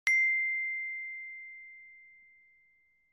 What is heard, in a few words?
Message iPhone